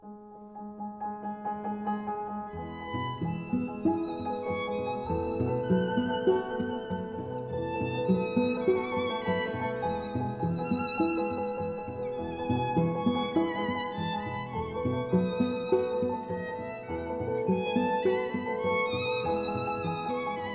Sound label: Piano Trio in A minor, Andante con moto; Var. 11. Moderato